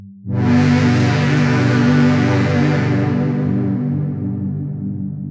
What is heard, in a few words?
Soaring Waves; jup8